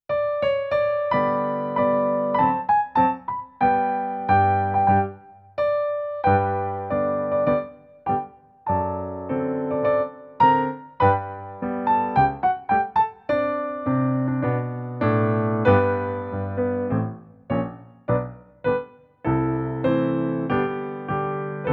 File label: GRAND BATTEMENT